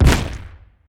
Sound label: tree coco explosion